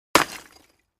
Clay Chimney Wall Hit, concrete & brick

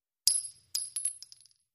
Shell Case Sngle Tube, shell casing movement

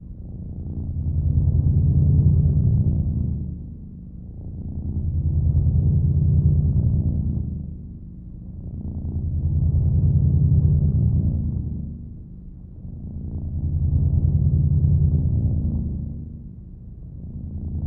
Ambience Machine Pu; drones & tones

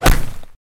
Gun Shot Bullet Impact Single

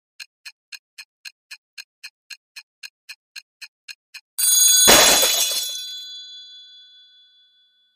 Alarm Clock Smashed

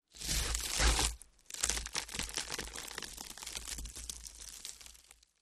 knife twist chest, stabbing

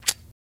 Bic Lighter Flick; lighters & matches

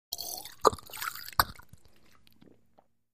Water Squirt Gulps, water bottle

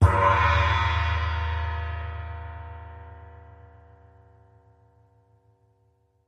Cymbol 6 Inch Splash Hit

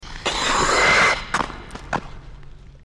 Ice Scrape Ice Skate, ice skating

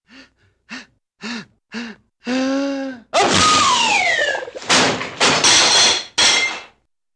5 Quick Inhales 1 Big, vocals

